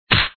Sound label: Punch